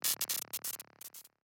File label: Electric Explosion Stinger